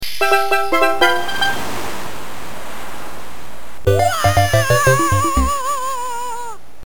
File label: Jingles; Super Mario 64 DS; Bingo Ball